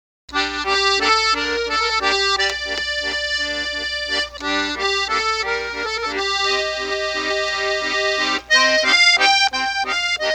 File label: Musette, accordion